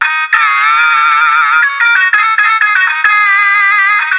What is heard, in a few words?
heavy